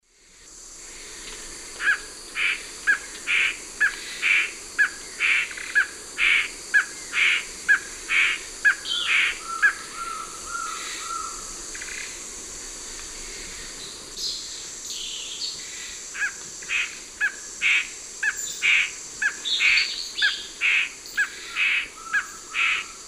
Cercomacra cinerascens, song, S. America Eastern Lowlands